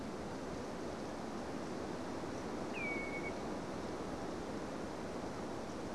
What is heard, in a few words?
Common Redshank single call; Lingshan; Beijing; nocturnal flight calls (NFCs)